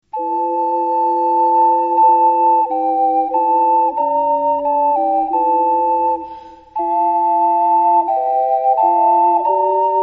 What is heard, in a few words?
kyrie, ocarina